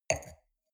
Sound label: wine glass place table soft short; soundpool